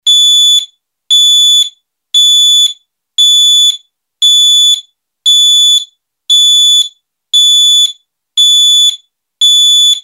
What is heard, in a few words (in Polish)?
ton MIDI